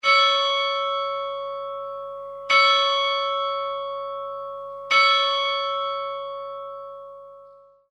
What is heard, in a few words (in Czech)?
zvon; krystof; zvonohra tony